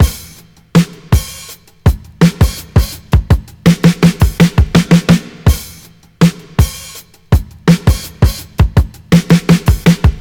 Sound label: 110 bpm breakbeat c sharp key